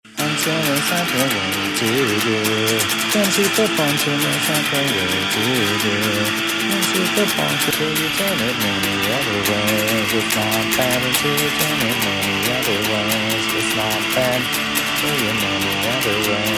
chorus

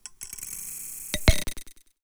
Clicks weird

